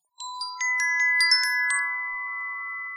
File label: denoising glock 0dB mmse